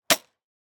nocked archery firing loose crossbow carbon bolt at bow snap buzz airy bright; Sonniss GDC